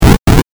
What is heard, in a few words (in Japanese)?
8bit ゴリラ